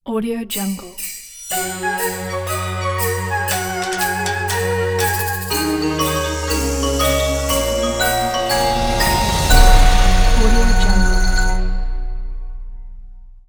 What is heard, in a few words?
lunar new year logo